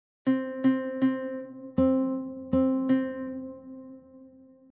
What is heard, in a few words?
Presto 160